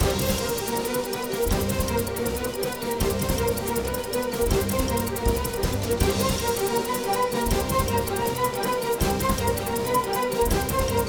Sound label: Music Mess Theme Bpm160 Loop; asterix obelix slap them all 2